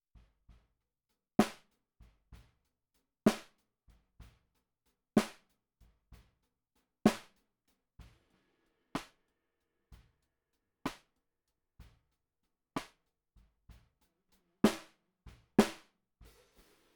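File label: Snare Bottom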